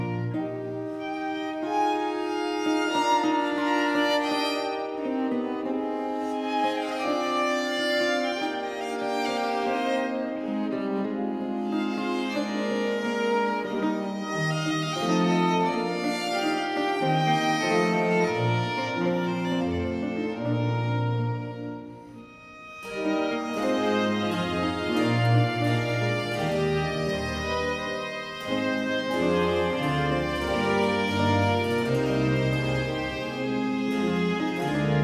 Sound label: Concerto 2 in F major 2. Largo - andante; Arcangelo Corelli; Corelli - Complete Works; Klassika